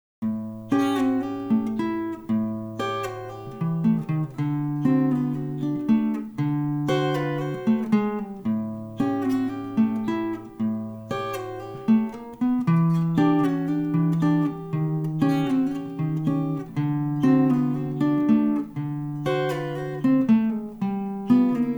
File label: ac tritone blues